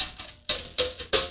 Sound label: MOUTH BOW PHR; Spectrasonics; Heart of Africa 2